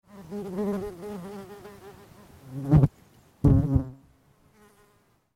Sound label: Mosquito Sound